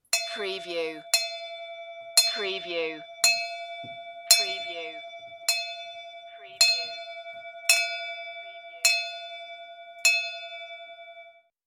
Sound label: Bell Ring Multi